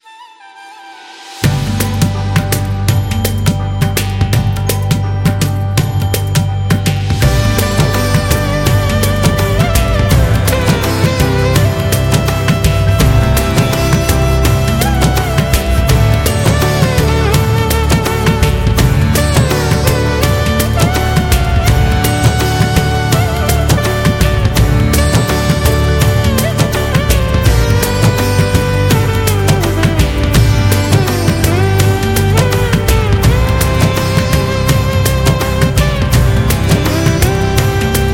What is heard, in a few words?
Traditional India